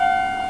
Rail Crossing CTK 8bit

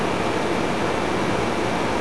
Blower

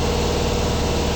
Compressor loop

